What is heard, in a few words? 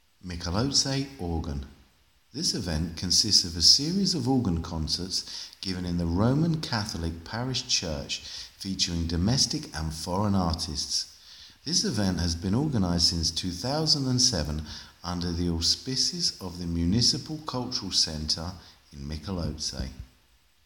organ recital; Michalovce